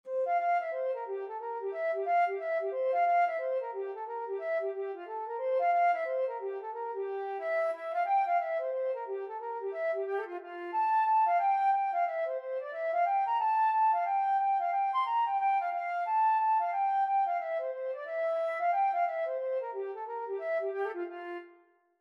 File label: flute